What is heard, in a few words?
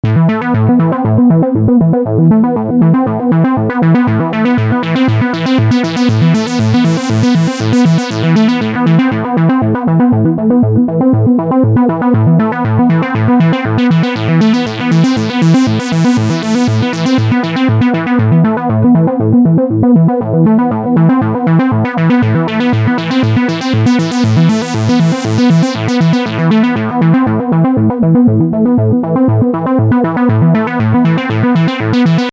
MS20 ting